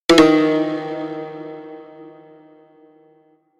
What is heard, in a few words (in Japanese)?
三味線効果音